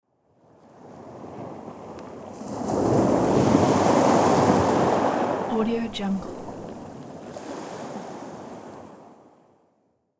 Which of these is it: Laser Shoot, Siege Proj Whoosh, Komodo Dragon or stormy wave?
stormy wave